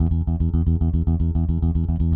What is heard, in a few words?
Sixteenth Notes E, Bass